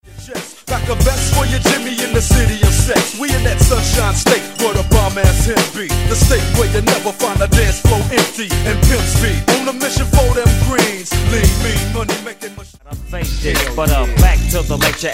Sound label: Old School Hip Hop RNB Megamix